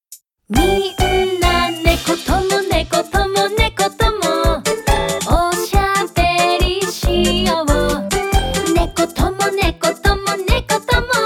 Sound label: OP VOCAL